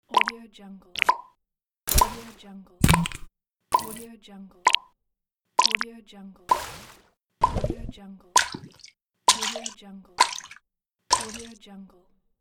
juicy pops

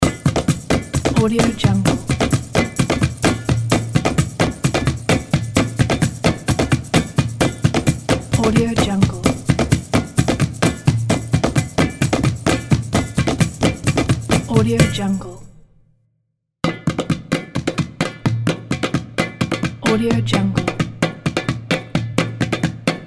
running drums and percussion

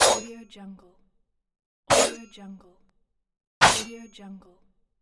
blade whoosh

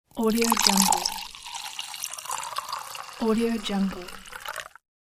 wine poured into a glass; sounddream

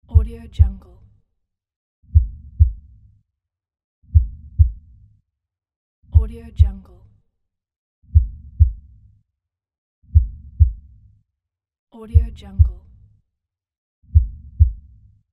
slowed heartbeat

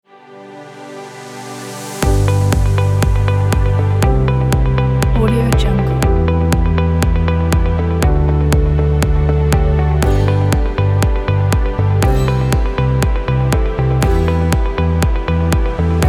inspiring corporate background

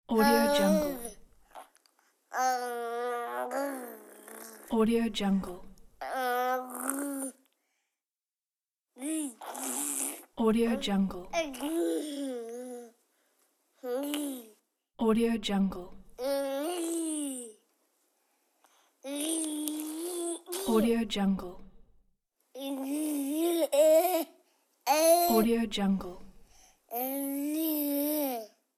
baby sound pack; djsapa

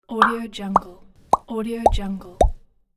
pop pack

